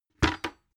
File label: bucket empty put down hard